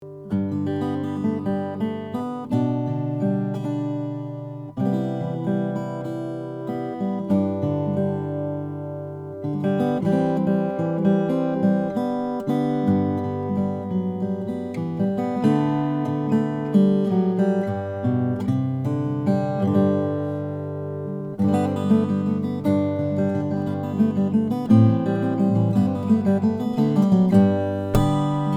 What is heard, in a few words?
lowden guitar s35 40th anniversary